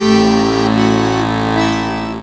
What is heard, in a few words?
ACC LINE, MELODIC; Best Service; World Colours